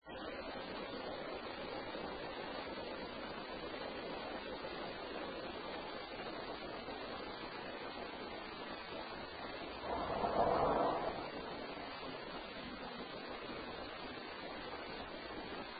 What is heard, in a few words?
Home Attic Unexplained BREATH; Case 021 Zepp